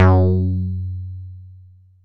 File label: MULTIBASS, Dance Mega Jungle Rave; 90 s Sample CDs; Best Service